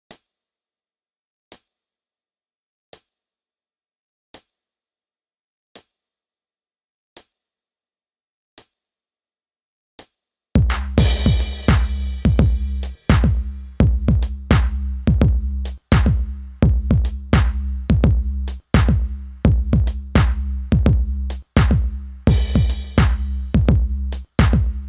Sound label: Drums II & Perc